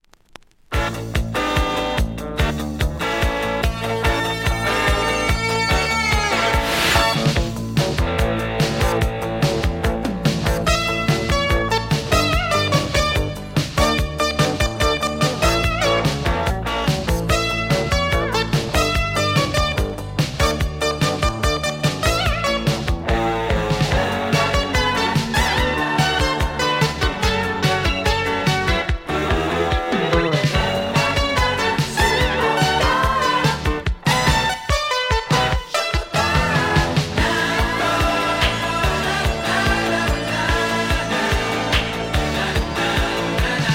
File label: instru